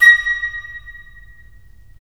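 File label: FL, flute; LA Composer vol. 3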